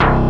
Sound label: analog bass, synth bass; Roland LCDP02 Guitar and Bass